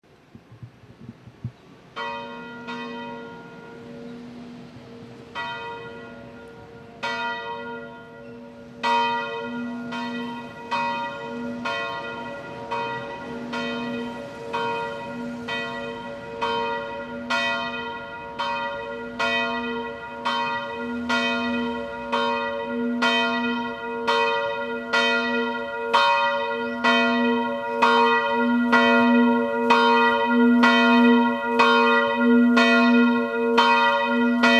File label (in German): glocke schiefer turm; gau-weinheim